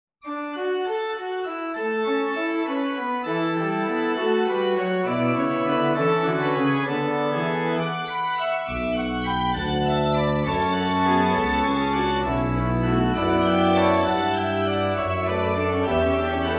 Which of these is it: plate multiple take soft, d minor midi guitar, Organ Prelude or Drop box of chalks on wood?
Organ Prelude